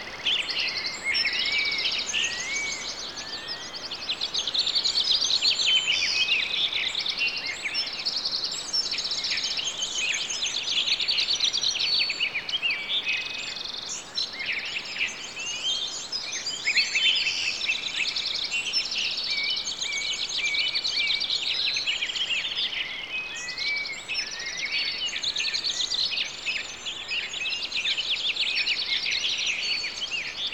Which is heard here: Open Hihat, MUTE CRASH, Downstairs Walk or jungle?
jungle